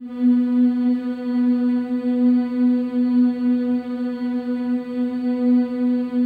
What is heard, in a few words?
CHOIR MM B3; Spectrasonics; Symphony of Voices